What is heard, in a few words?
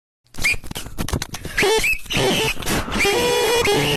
kid screaming